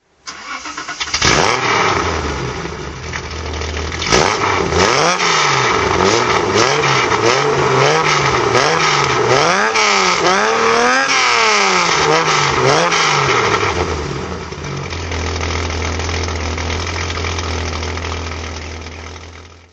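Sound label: datsun 240 z rally throttle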